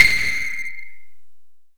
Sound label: Snare weird, drum hits; Nu Dance Electro